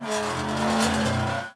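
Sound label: cauldron Arm Swing; objects; Star Wars Obi-Wan; XBOX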